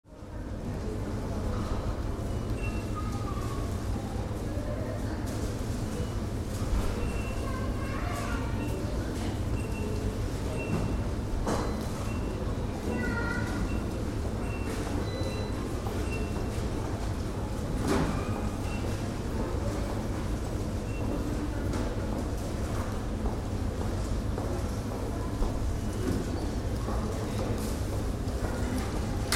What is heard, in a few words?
Supermarket ambience light people talking voices activity